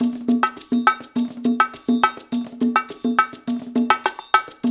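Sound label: 108 bpm, conga; Spectrasonics - Supreme Beats - World Dance; Cong-A Go Go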